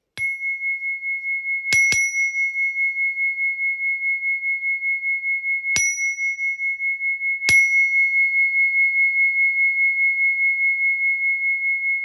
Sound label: Chime